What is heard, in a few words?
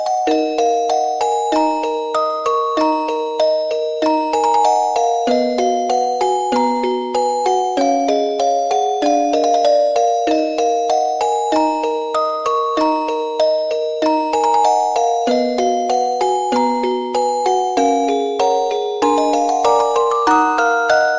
Music Box